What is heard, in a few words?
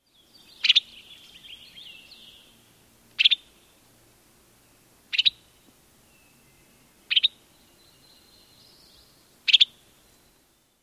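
calls, Western Tanager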